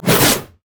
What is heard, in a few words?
swipe; nidalee